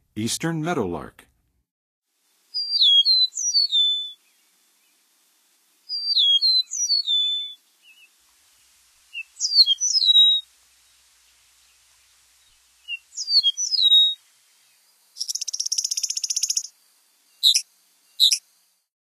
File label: Eastern Meadowlark, Songs of Birds of North America; Western USA; Western USA Songbirds